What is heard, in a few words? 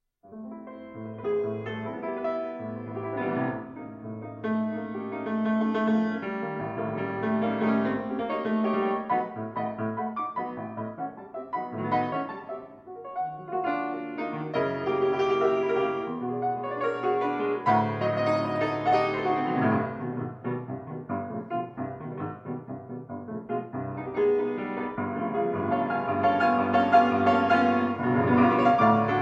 Sample of X Piano